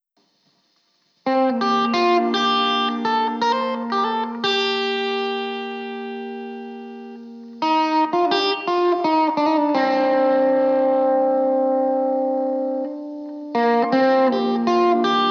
guitar, 85BPM; Pony Fan Music Remix Stems

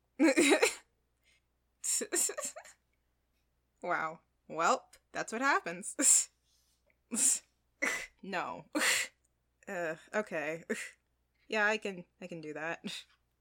Just Laughs

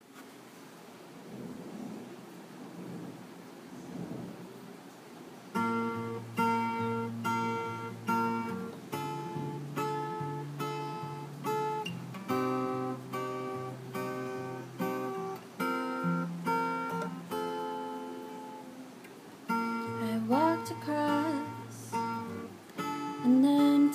Cover, Rainy sounds, Bad Guitar-ing

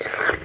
Suck

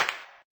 Sex Smack

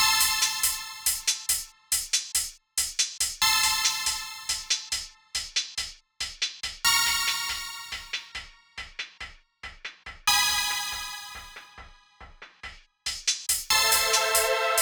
dnb brostep; Pony Music Archive

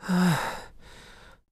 Moan

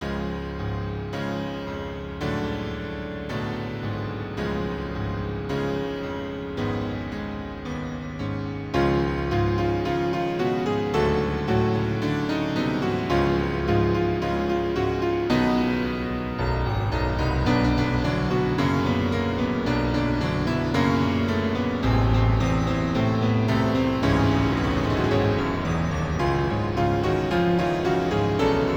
This Day Aria Double Piano Cover

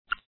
Suck; Lewd SFX

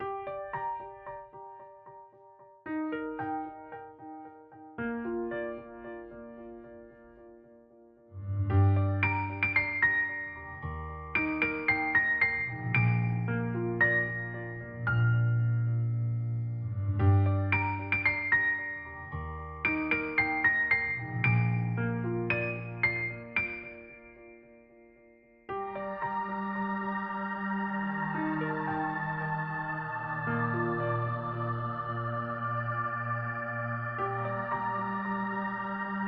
Piano; Chirpy Bird

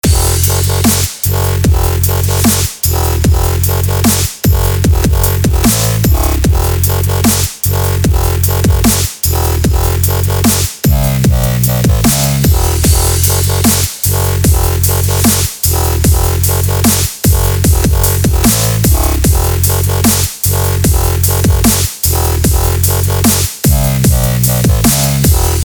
dubstep

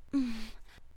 Grunt; Lewd SFX

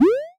jump

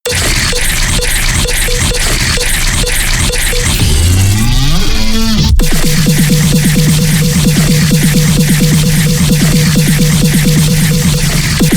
Cowbell

